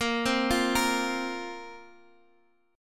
A sharp Suspended 2nd A sharp down Guitar Standard; arpeggiated; JGuitar